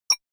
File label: ui click; La Fabrik